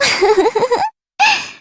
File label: giggle